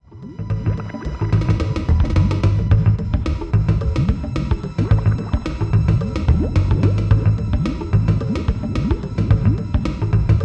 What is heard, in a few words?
Hot Head Bop Percussion